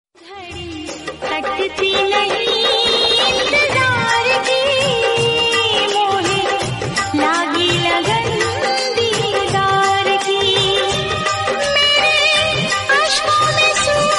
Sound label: punjabi love song